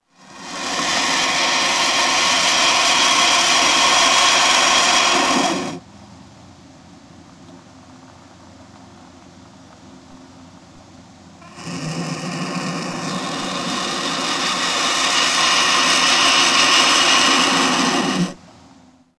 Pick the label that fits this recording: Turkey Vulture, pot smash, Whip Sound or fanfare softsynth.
Turkey Vulture